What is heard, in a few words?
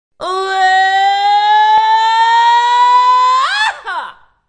diva oehaa rising pitch, Cheesy Divas, vocals